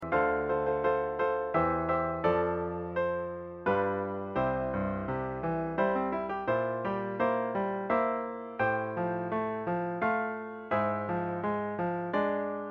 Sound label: Piano